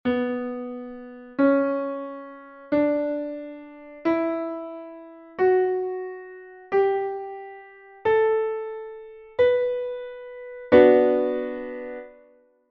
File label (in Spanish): escala si menor